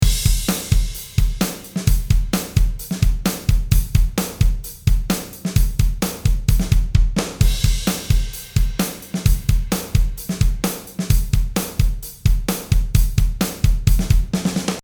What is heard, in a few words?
Drums PassiveEQ